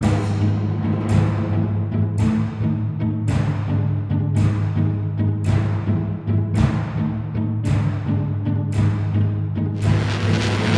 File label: Space Battle Low; Galaxy on Fire 2 HD